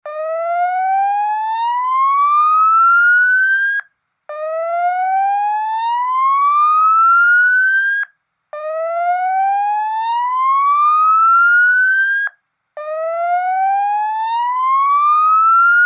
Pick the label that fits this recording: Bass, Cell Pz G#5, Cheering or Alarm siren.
Alarm siren